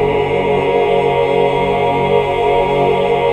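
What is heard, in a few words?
chord 2 1 R; Best Service Gigapack 1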